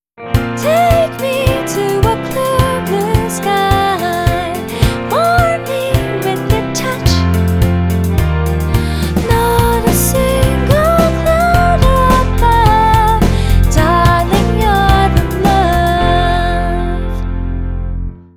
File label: emoving music normal